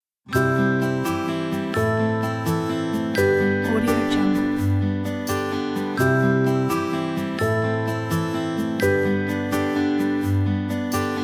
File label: easy light acoustic